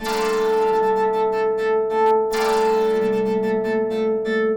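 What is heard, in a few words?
RHYTHM PAD, AMBIENT PAD; Trance; AMG - Global Trance Mission VOL-2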